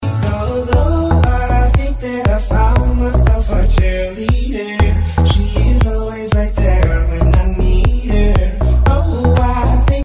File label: cheerleader